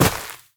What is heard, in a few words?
step run, footsteps player grass